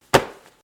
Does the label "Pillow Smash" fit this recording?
Yes